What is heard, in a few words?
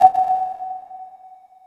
drip